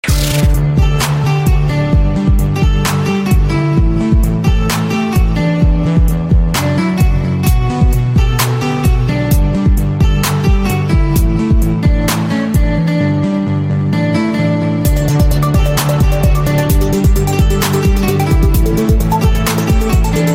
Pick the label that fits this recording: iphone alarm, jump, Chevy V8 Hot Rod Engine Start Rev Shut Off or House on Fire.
iphone alarm